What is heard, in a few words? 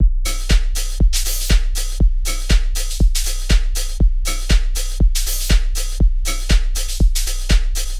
120 Housey, Electric Drums; Loops; Touch Loops; CosmicFunk